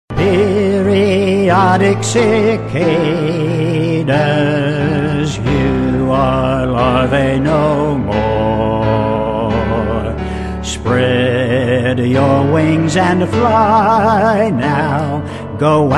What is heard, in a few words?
Periodic Cicadas ringtone; Dr Chordate